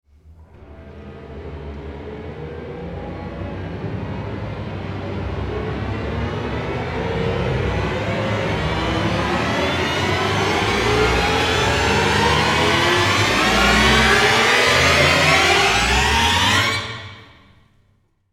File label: rise